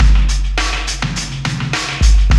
Loop, Roland; Sound & Vision - Gigapack I

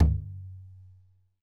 MADAL, DRUMS OF IND; AKAI S6000 CD-ROM; WorldSounds

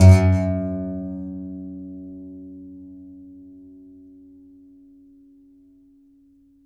C. Bass Balalaika, Plucked Strings

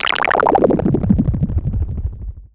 Misc Synth FX; musicradar; cartoon caper

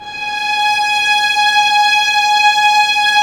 STR VLS, String Master Series, Vlns 6 p-mf; Vlns 6 mf-f; Vls6 mf slo

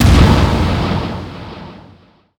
FX HIT, Techno Trance; Ueberschall

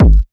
D&B Kick, Drum & Bass Kit; essential drumkit

